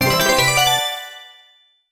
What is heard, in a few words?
Item Get; Paper Mario - The Thousand-Year Door (GC DSP)